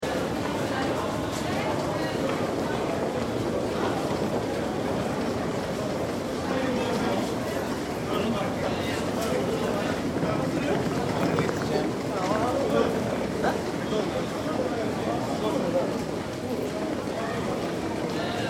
Train Station Rush Hour, Large train station crowd hum. Some voices in Swedish and other languag; Occasional footsteps with travel bags on wheels.; Train Sound Effects